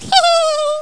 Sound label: laugh; Worms